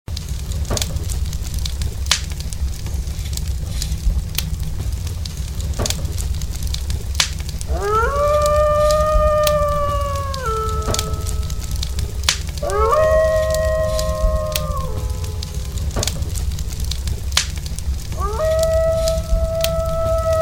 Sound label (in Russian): tresk kostra i voj volka